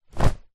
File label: foley coat jacket thick drop floor; zapsplat